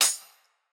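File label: closed hi-hat; eddyflux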